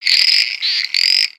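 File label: Pets Insect Large Angry, creatures; Lego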